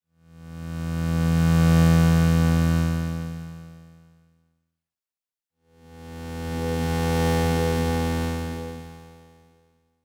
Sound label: EQ LFO